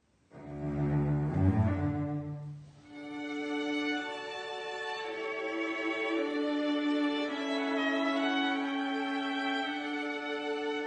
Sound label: Viola Quintet in D Larghetto Allegro